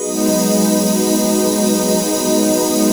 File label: Pretty Pads; E-MU Formula 4000 Series Vol. 1; Hip Hop Nation